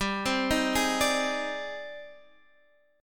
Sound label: G sharp Major Sharp 11th G, chord